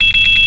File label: tripmine ignited